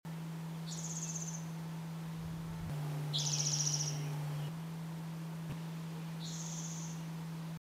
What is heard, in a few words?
towhee Call